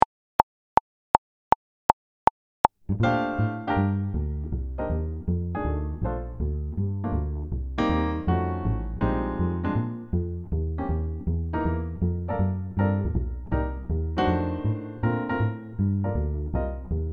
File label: Senior Drum Set Playalong